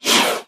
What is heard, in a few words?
Cobra, Indian Hiss. Close Perspective.; snakes